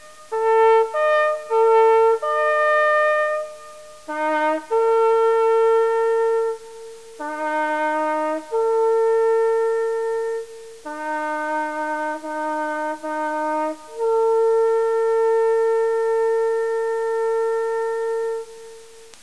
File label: Wooden Lur